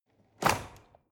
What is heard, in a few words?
drawer cutlery open lock